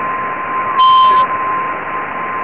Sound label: echo; meteors